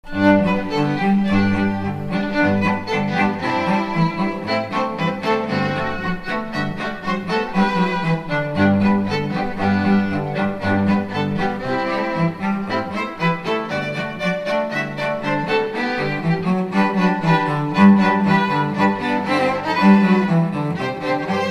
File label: Jingle Bells